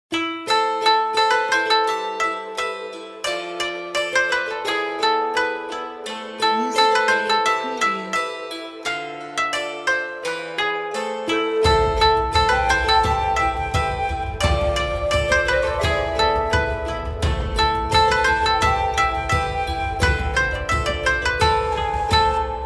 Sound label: Ukrainian Patriotic March